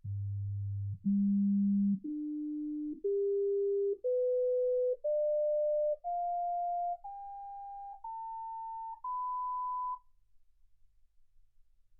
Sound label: sinus tones 48kHz